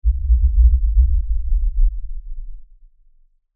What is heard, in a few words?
Lfe Rumble By Swell Low Bass Movement; Blastwave; Sound Design